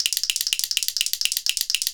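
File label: clacker double; ILIO; Synclavier Percussion 2; World & Orchestral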